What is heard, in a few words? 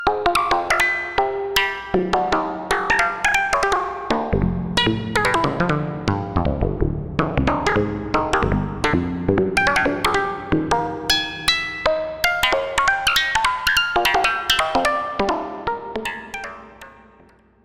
Plucky Patch